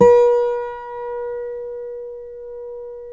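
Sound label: GUILD 12-string, Guitars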